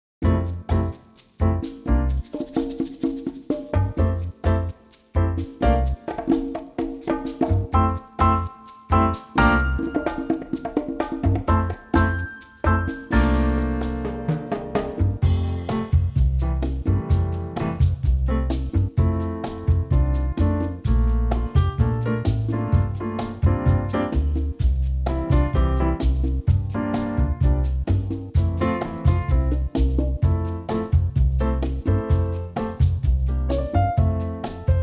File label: F bossa